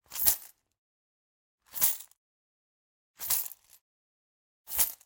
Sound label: Generic Click Metal Small